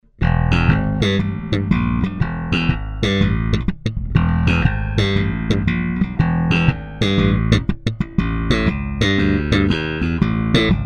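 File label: fender deluxe passivo slap, bass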